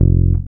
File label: REAL BASS, BASSLICKS; Ueberschall; Jam Box